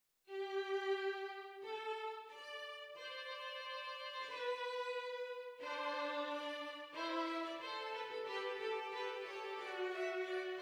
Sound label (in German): doppelfuge; Hess 243; non citata da Nottebohm; abbozzo Ronge